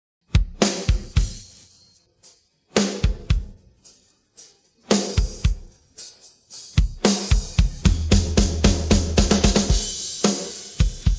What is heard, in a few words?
drums pattern